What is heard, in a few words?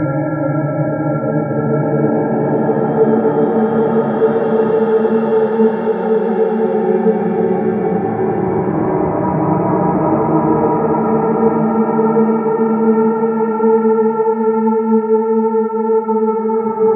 Moan Amb, Ambience, Interior; Warframe; Orokin